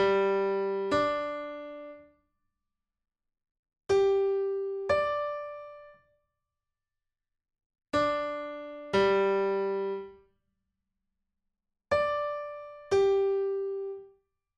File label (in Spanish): Intervalo quinta justa